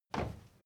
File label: step crowd march quiet single right; schritte